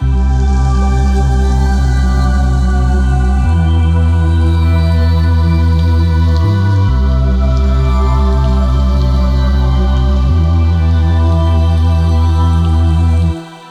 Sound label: 140BPM E; Vengeance - Essential Clubsounds Vol.4